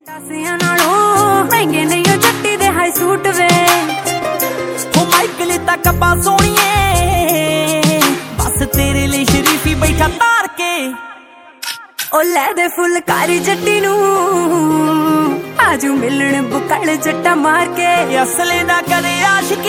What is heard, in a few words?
punjabi geet ringtone